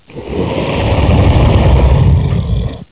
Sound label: growl